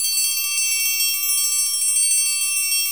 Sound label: PRC TRI, ORCH PERC